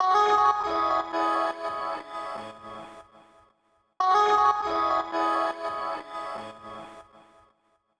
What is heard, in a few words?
Gtr Fx Loop 120, Loungin House; Music-Fx Loops 120; Equipped Music